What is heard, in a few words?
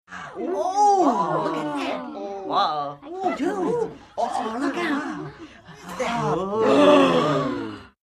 Group 12 Yr Old Walla